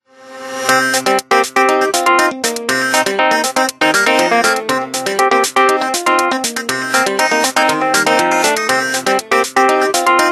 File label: Ring Tanktop